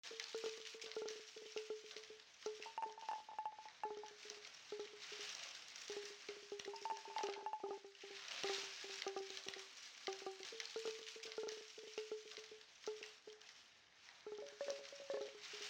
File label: Hat Room Ambience; Chuchel